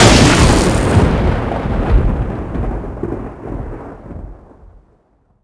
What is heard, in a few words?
electro, weapons